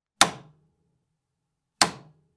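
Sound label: hit sound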